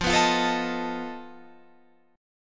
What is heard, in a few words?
F 7th Flat 5th F down